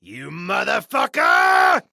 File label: male hate attack